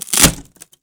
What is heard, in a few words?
wood break